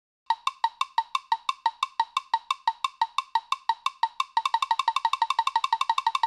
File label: Power Up; Platformer